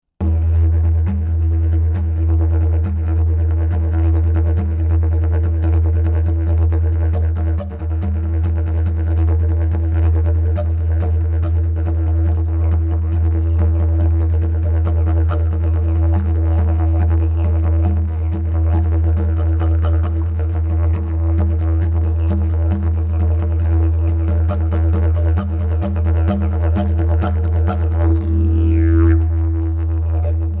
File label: didge; didgeridoo 629 key e